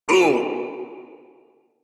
Pain Lich D; Kuva Lich

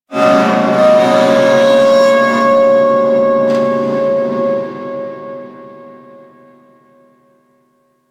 Infested Distant Metal Groan, Ambience; Warframe; Corpus Infested

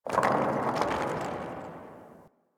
Venus Landscape Rock Slide Low; Ambience; Warframe; Venus Open World

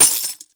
Fist Claw Coin Hit; Warframe; Tenno; Incarnon

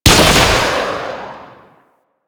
Arch Rocket Crossbow 3D Fire, Weapons; Warframe; Archwing

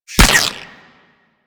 Ghost Expel Spirit Impact

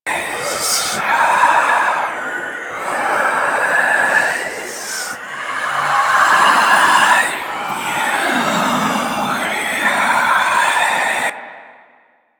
Rell Specter Aura Drain Loop; Enemies; Warframe; Priest Quest

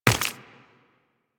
Run Flesh, Footsteps; Warframe; Tenno

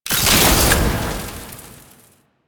Alchemy Grenade Pickup Fire; Warframe; Entrati

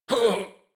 Death, en; Warframe; New War; Teshin

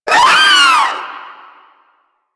Proto Infested vocal short attack

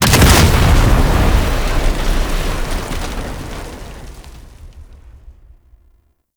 gatling gun explosion; Grineer Prime; Warframe; Tau

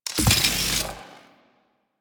Electrical Hazard Out Of Service Close; Warframe; DE; Vehicles; RailJack; RailJackHazards

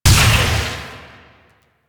Incarnon Shot Small; Weapons; Warframe; Duviri; Incarnon Adapters

